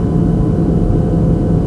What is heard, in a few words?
hovercraft; Chopper2K; Revista CD Expert 45